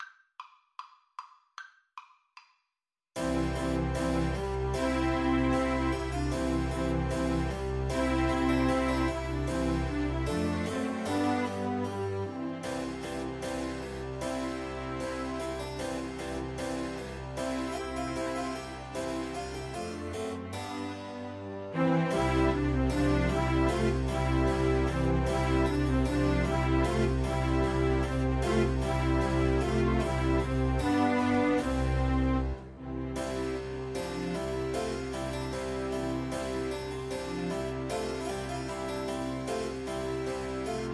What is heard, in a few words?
vivaldi spring piano